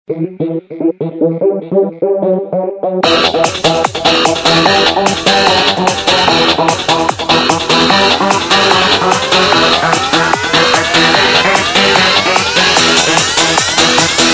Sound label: Loud Techno